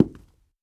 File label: walk wood